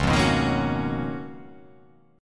Db 13th, chord; strummed; JGuitar

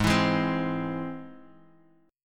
G sharp Augmented G sharp Guitar DADGAD

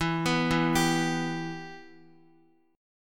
E Minor E down Guitar; arpeggiated; JGuitar

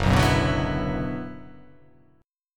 F sharp 7th Suspended 4th Sharp 5th F sharp down

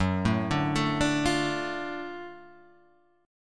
Gb Minor Major 7th Sharp 5th Gb

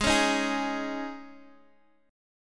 Ab Suspended 4th

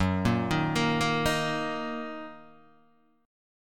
Fsharp 6th down Guitar Open D

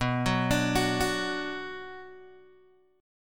B Minor B Guitar Open G

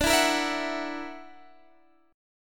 Gb Augmented 7th D

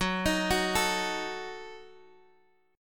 F sharp Minor 6th F sharp Guitar Open F; F#m6 arpeggiated; x,13,15,13,12,x

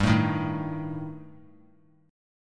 G Suspended 2nd Sharp 5th; Gsus2#5 strummed; JGuitar

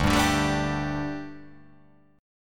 D Suspended 4th D

